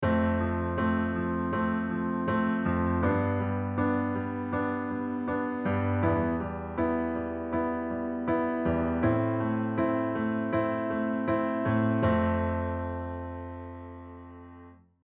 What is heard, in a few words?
comping